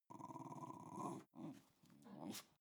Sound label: dog snarl playful